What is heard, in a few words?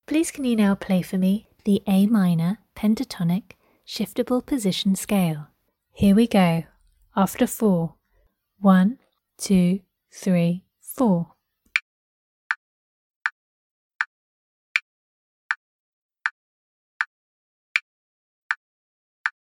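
a minor pentatonic shift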